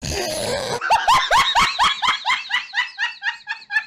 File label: hysterical laughter; meme